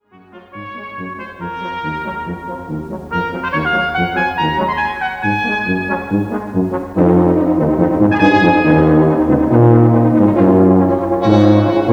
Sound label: Brass Suite